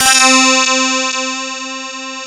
DS8 C 4, Korg; Back In Time Records; Korg Universe VOL-1

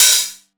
909 OHH; Wizoo; Platinum 24 Electronic; HHAT

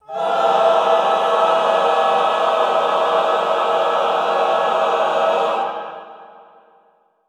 classical choir, cluster high; Best Service; Extended Classical Choir

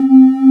MATRIX PAD; E-MU Formula 4000 Series Vol. 5; Protozoa; Vintage Presets

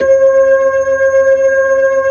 B-3, Blues, Keys of the 60s and 70s; Roland LCDP10 Keys of the 60s and 70s 2

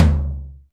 BIG O HI, TOMS; Back In Time Records; Big Bang

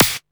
snare, techno 138 BPM

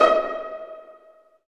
STR PIZZ, String Sections, Violins Pizz; Roland LCDP13

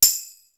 DANCE SET, DRUMS

